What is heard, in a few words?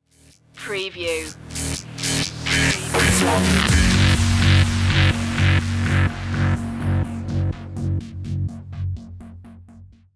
phunky beat stinger